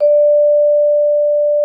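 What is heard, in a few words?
vibraphone D5; Xsample VOL-4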